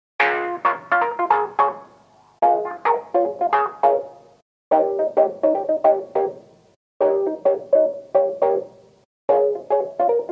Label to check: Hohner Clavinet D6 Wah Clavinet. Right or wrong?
Right